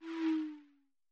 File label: pin out